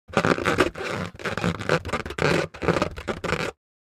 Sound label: Rubber object movement